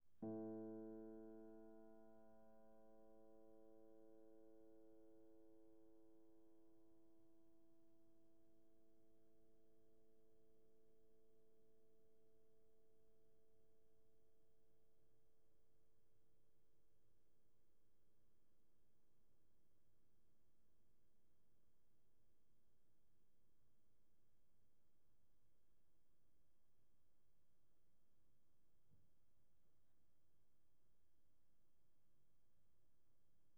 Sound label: Piano pp A2